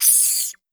spider squeak; Politburo

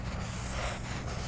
scribbling; Politburo

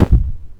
chest poof; Politburo

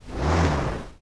speed up loop; Politburo

